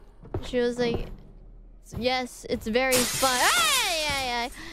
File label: Scream; IRyScream